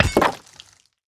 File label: axe mining stone; immersive sounds; Factorio Paranoidal mod